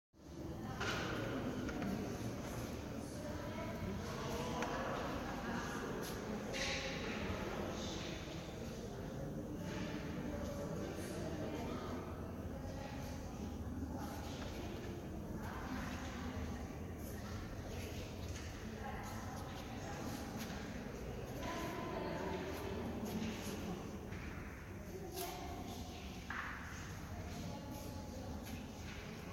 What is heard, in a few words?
Basilique Saint Nazaire Carcassonne